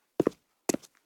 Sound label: Footsteps Rock; Idle Champions of the Forgotten Realms